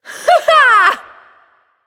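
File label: yay, female; smite; voicepack; hera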